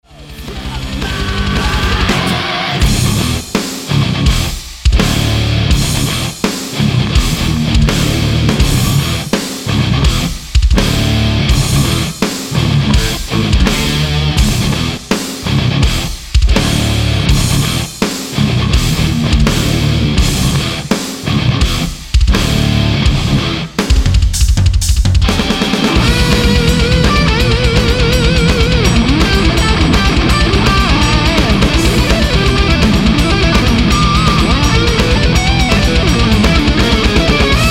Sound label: Modern High Gain